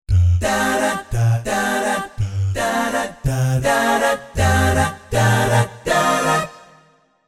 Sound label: Dah-Dat, Jazz Group Multisamples; Spectrasonics Vocal Planet